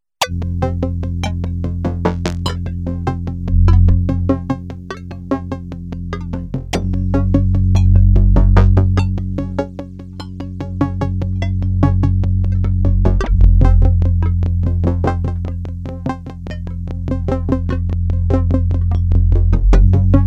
synth arp bass, yamaha sy99

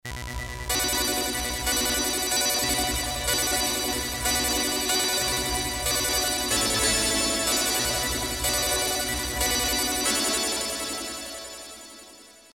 synth lfo, yamaha tg500

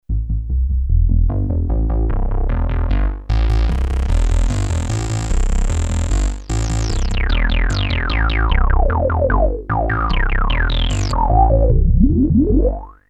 bass; redsound darkstar xp2; Bass Vintage; Main core based on H8/3002 family (16/32bits) + dedicated audio DSP ADSP-2185

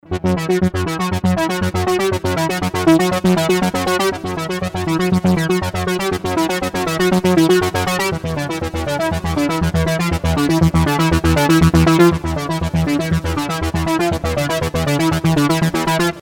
synth ebm; Creamware minimax

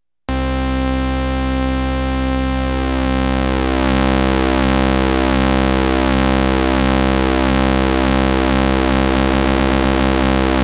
ringmodulation, access virus synth

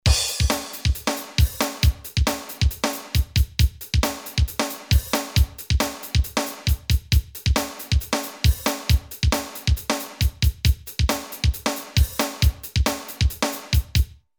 drum rock, Drum: rock, Roland JV2080

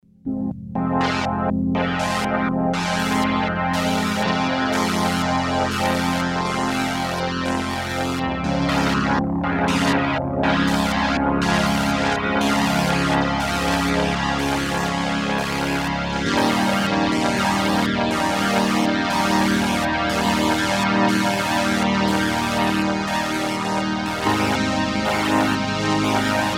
pad filter, Kawai sx240

